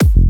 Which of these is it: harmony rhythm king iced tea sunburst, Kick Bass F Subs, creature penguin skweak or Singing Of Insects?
Kick Bass F Subs